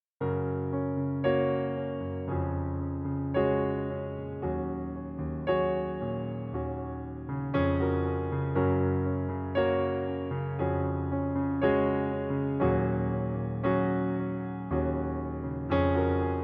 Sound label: All Black Notes Backing Track